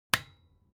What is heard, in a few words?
button desk lamp on hard; ui; interface sounds